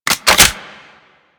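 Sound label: Primary crossbow Reload Clip In; Warframe; Tenno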